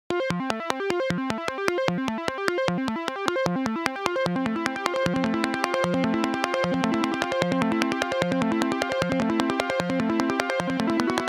Yamaha SPX90 Delay Synth

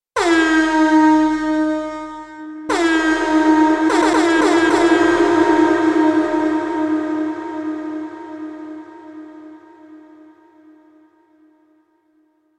KOPRO+ Air Horn

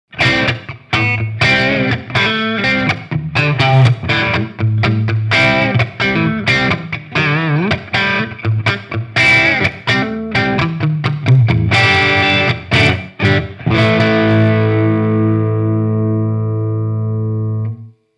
PUs Seriell Crunch

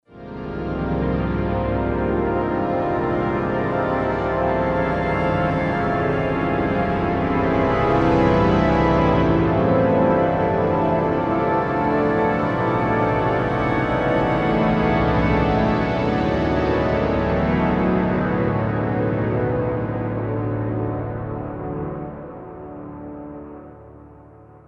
Orchestral Scene Major Patch; Steinberg Absolute 5; Polarities

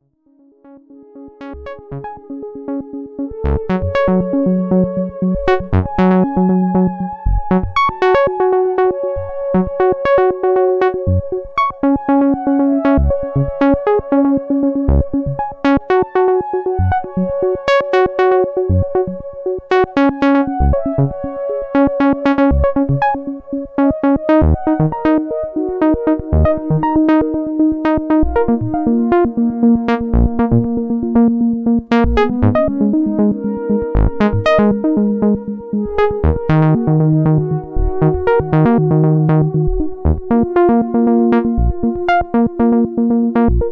pulse; jup4

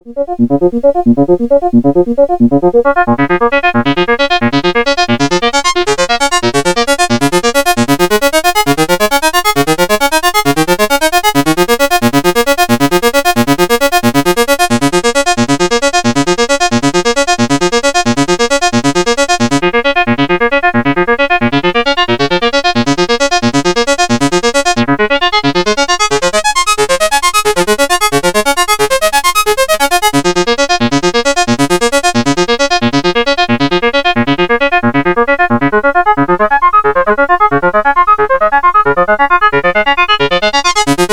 ARP Avatar SYNC via AFT VCF via VEL WHEEL

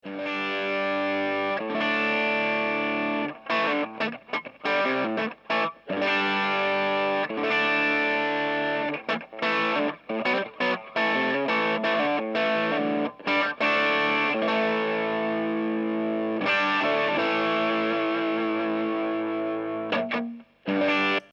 full gain sw unten

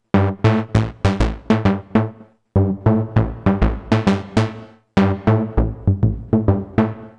Korg Volca Keys Gated; Behringer Space FX

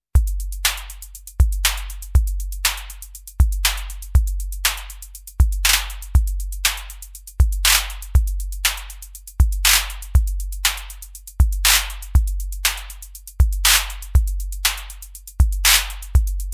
Clap Fake

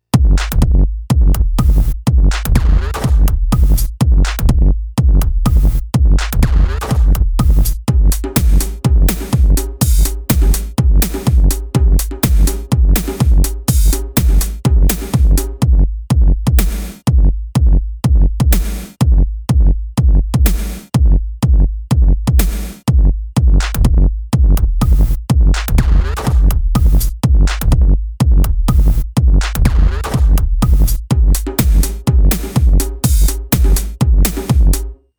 EDM Drumkit AWM; MODX

